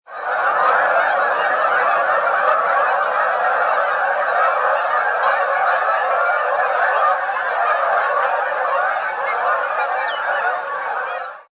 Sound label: geese takeoff